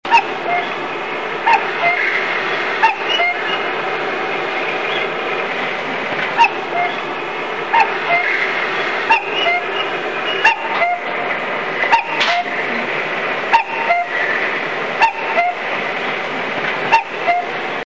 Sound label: train chime; 能登; グリーン車; かっこう; ４８９系のチャイムです。先頭車とグリーン車で搭載してる曲が異なります。